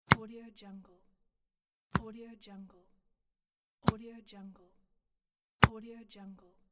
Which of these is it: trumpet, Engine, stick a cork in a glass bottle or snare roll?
stick a cork in a glass bottle